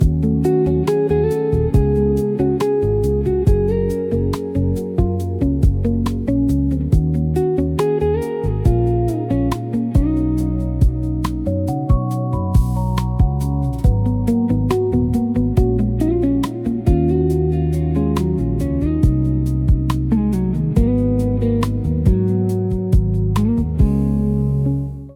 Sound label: happy vibes ringtone; (किशोर स्टाइल)